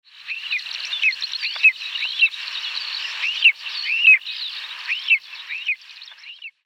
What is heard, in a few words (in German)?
Schreiadler Aquila pomarina; Vogelstimmen